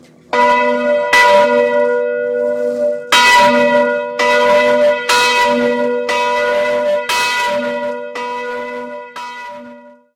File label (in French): grosse cloche